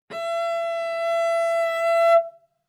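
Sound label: Cello arco fortissimo sul A E5; MIS Pitches - 2014; Strings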